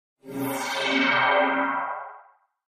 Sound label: Wash Mystical Elect